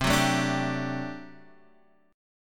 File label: G Major 7th Suspended 2nd Suspended 4th